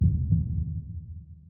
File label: heartbeat